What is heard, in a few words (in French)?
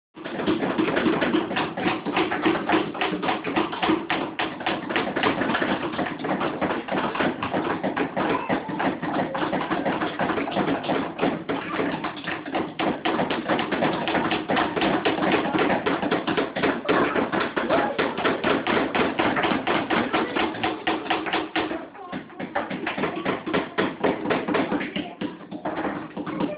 Vocal